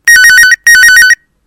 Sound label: telephone ringing